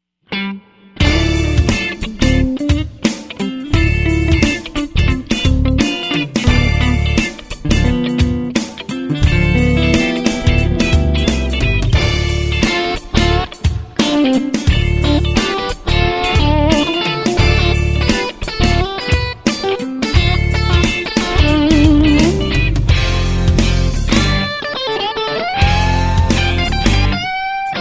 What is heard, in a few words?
funk jam